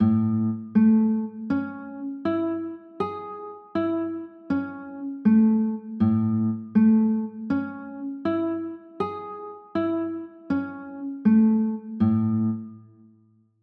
a maj7, chords

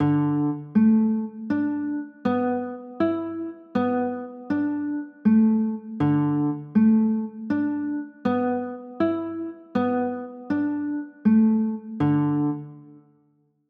d 6 9

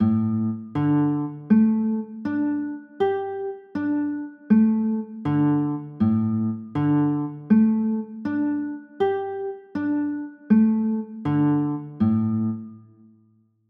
d sus4, chords

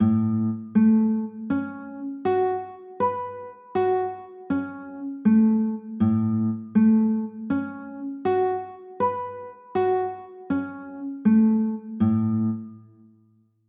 a 6 9, chords

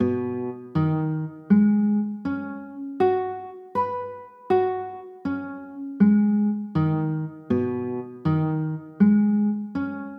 b 6 9, chords

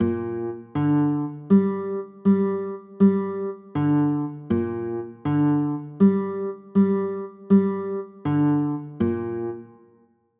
b flat 9, chords

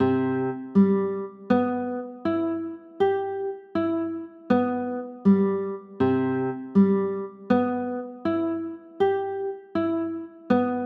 c maj7

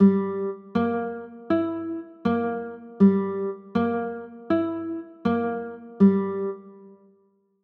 g dim, chords; g dim7

